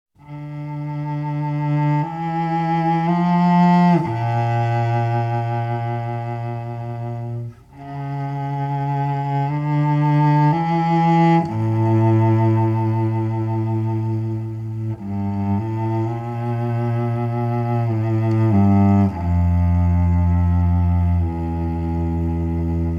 largo for cello

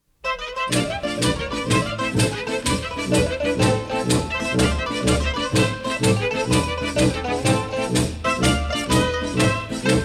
tarantella napoletana

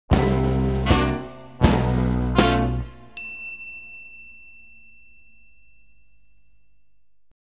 slapstick effects

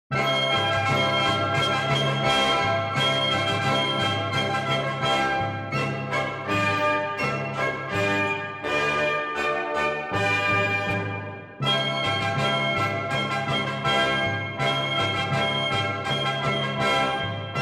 triumphant march

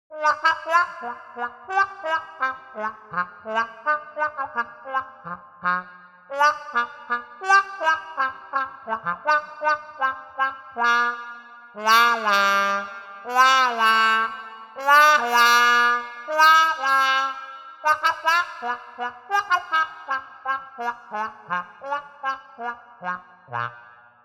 froggy trombone

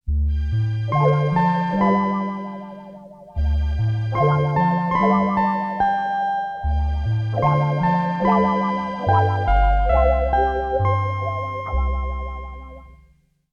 little lullaby

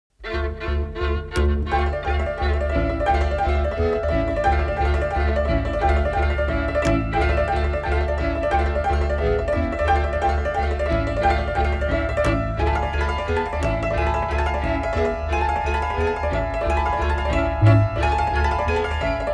transylvanian gypsy dance

